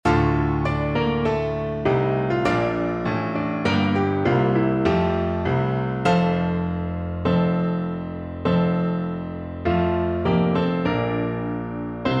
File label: march of the women, Tuba